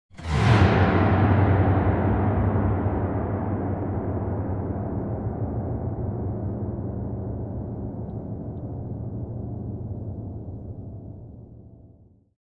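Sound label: Grand Piano Strings Deep; keyboards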